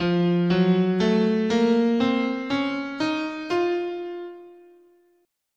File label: F Phrygian Dominant, scale